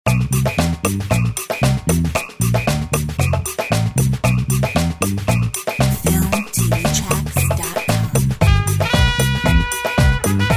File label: Funka latina